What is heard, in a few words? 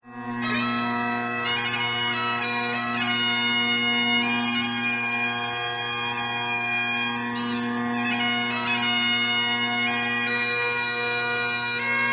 bagpiper, tune samples